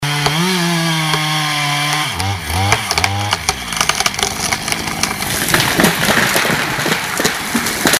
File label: chainsaw